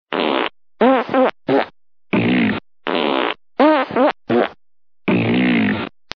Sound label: Smelly Fart